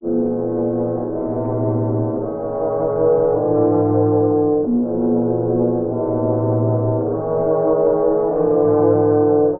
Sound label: strings creeping terror; Loops; Sad